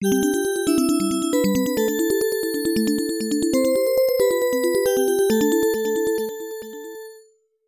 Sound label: Startup; Neo Geo; ADK Version